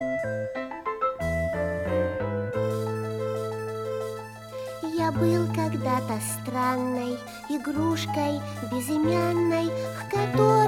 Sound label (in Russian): instrumentalnyy ansambl; cheburashka; melodiya